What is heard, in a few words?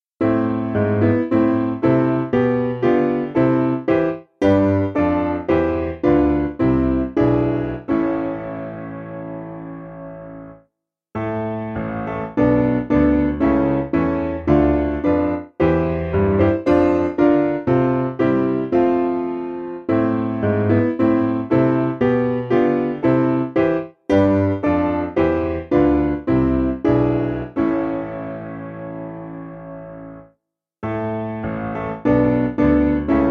Piano